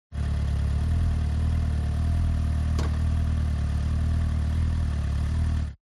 TRANSPORTATION MOTORCYCLE Sport Bike Idle Kickstand